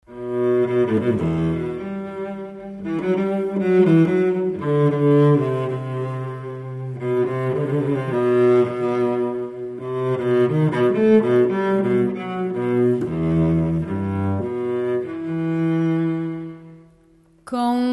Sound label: trio fado